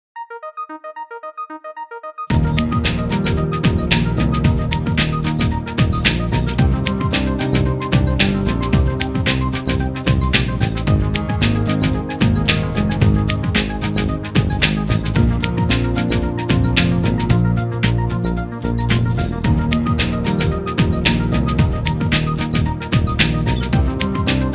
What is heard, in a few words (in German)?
klarinettentaxi